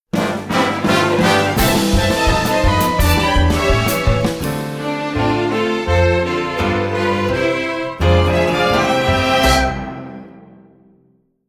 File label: Fanfare Playoff